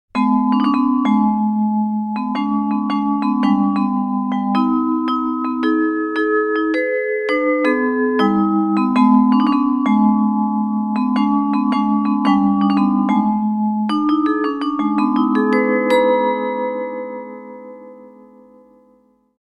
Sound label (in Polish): wibrafon